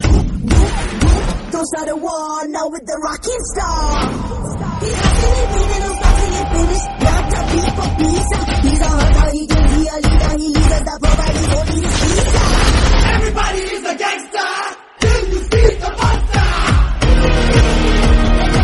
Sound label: monster bgm tamil; kgf 2